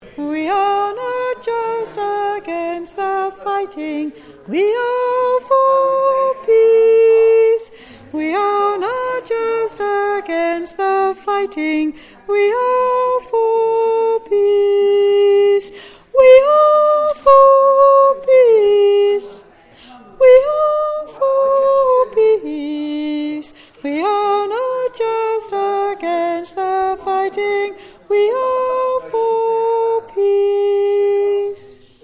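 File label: soprano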